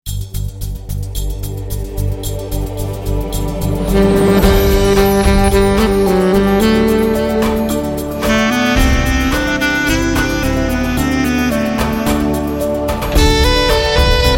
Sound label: Instrumental; Om Jai Jagdish